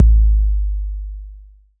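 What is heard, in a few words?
bass drum 808; Best Service Gigapack 2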